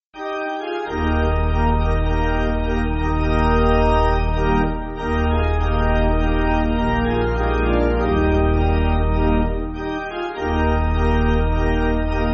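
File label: Pipe, Pipe Organ, Music Backing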